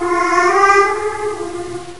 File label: rat chant; ambient